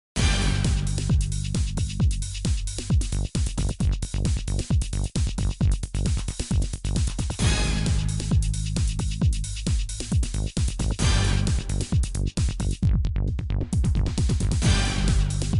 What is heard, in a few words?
Powerful